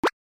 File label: pop